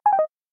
Error, ui; Alcatel OT-903

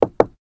Knock, notification

